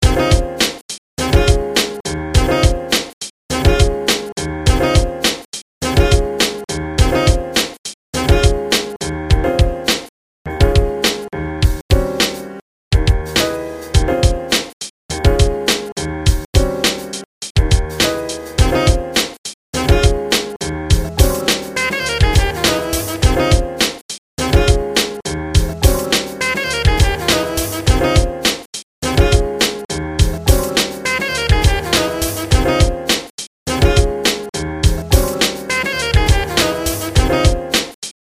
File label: jazz